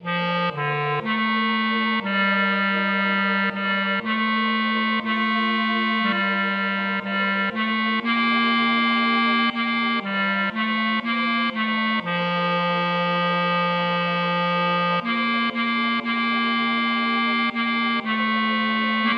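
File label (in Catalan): Tenors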